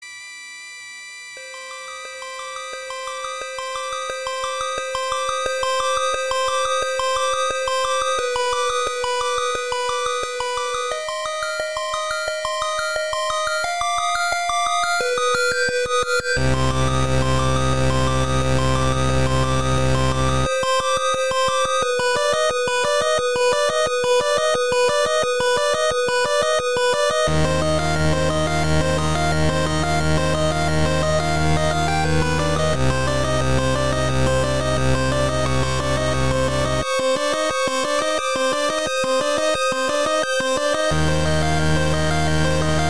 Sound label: 8-bit